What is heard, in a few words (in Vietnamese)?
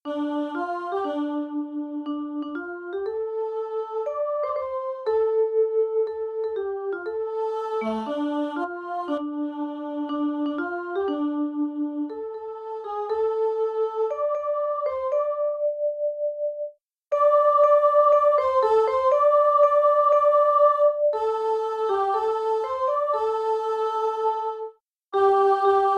nhac midi